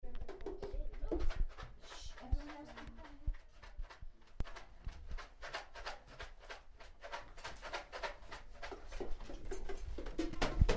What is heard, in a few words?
shake